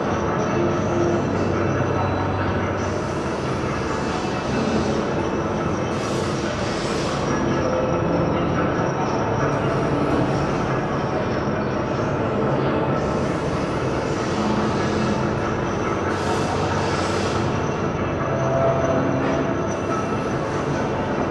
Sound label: Move Loop; Titanfall 2; Boomtown